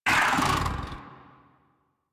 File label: Catbrow Combat Vocal Attack Medium; Warframe